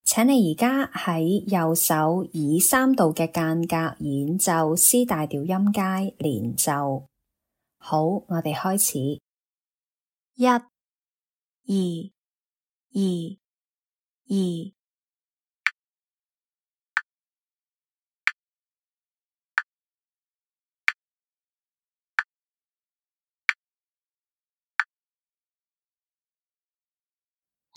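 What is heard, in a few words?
C major legato scale in 3rds right hand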